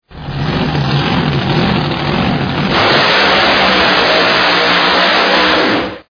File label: dragster